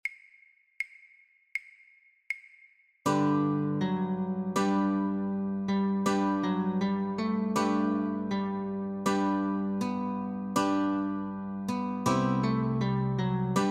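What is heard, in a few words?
Notes & Chords, Guitar; christmas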